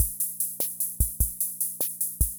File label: Kent K-220 Rhythm System Loop, drum machine